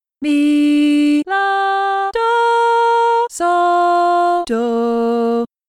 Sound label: Hard Melody Solfa